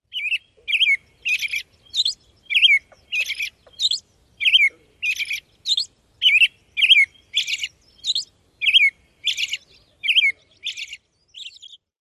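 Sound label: American Robin dawn song; NY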